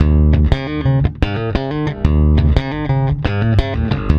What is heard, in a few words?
PWR E, Bass, PWRSTRUM; Spectrasonics; Bass Legends; 128 PWRSTRUM